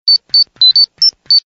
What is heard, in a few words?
Cute Sms